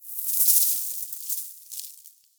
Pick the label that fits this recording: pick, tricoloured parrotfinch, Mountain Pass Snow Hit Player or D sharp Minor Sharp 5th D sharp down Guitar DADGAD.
Mountain Pass Snow Hit Player